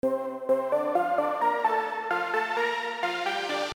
synth

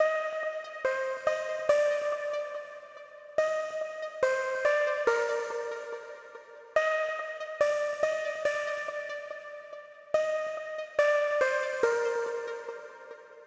Cm 142bpm pluck; Drippy Drill Melodies

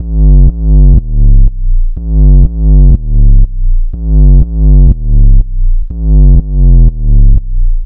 122 BASS LOOP F m

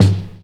Kik

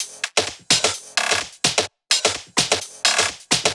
drum loop percs 128

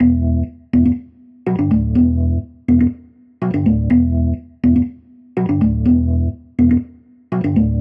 123 B Organ Keys

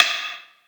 snare one shot wooden

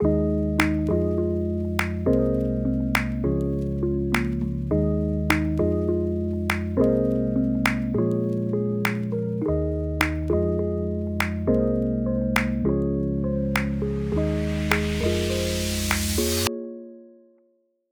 102 cute loop hopeful chords clap G-maj

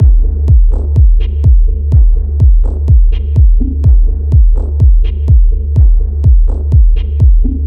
Drum Loop Bbm 125bpm

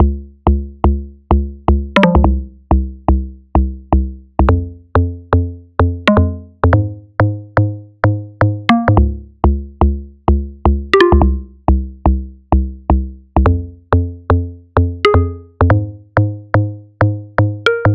107bpm Log Drum Bass Melodic Fm

